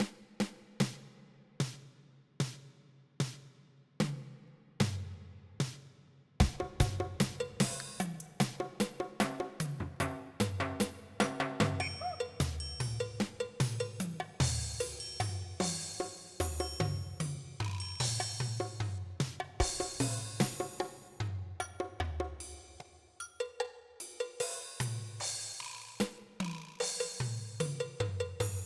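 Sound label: percussion, electronica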